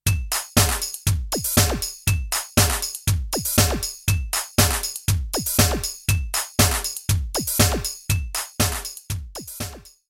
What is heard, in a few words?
ry10 120bpm